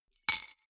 key ring large drop stone; objekte; schluessel

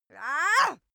female attack

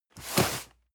cardboard box medium slide hard; objekte

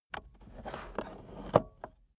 car accessories cup holder push in slow

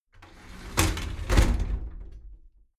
shower slide door inside close regular

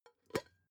pot lid open plop soft; objekte; topf

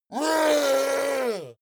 yeti attack shout aggressive painful; schreie

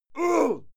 male fight yell; menschen; schreie

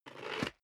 step leather shoes concrete grit scuff

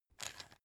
pepper mill light; soundpool

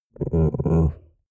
chihuahua growl muffled

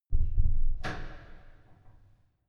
closing church door soft

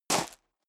step sneaker gravel medium single right; schritte

